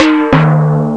tympani; DeluxeMusic2

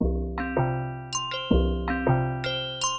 DRUM LOOP ambient 160

